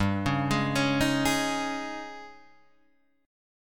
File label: D sharp 11th G